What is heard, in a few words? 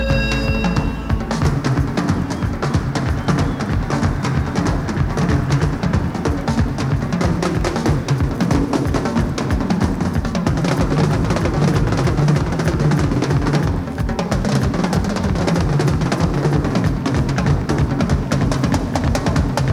Drum Duet